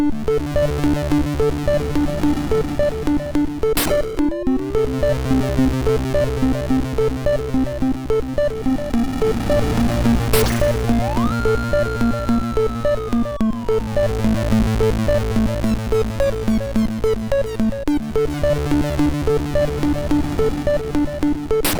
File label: sid 6581; MOS6581R3